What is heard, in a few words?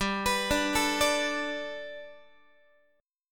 G Major Add 9th Guitar, chord; x,15,14,12,13,13; Gadd9 arpeggiated